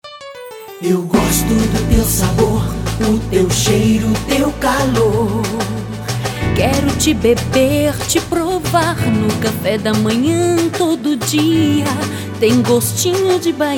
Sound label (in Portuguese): Jingle; Café Bahia